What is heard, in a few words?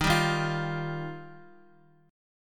Eb Major Add 9th, Guitar, Ebadd9 chord; Ebadd9 strummed; x,x,3,0,3,1; 4F,4A,5C,5G,5C,6E; JGuitar